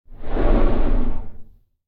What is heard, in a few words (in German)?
schaden; Barotraumatic; Hornshrimp